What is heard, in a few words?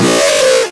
Synths C#; Vengeance essential club sounds-3